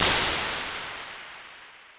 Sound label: imp church; インパルス応答